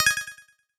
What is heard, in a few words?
Confirm Quick